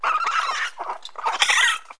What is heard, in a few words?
raccoon; animals